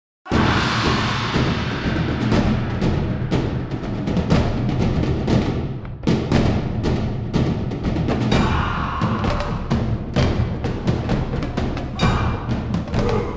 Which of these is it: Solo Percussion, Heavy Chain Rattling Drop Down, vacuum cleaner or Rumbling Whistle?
Solo Percussion